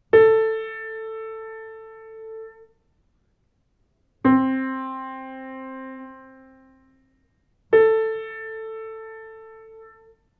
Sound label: keyrelease